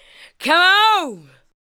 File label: ADLIBS